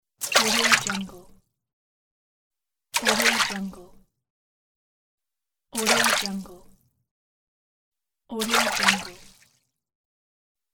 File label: fish water jump; nature sounds; صدای پریدن ماهی از آب